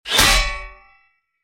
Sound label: shield